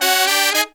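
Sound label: Pop & Funk Brass, 110 Ab, left; Best Service ProSamples vol.25; AKAI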